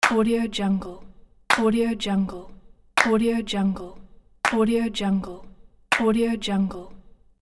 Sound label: hand claps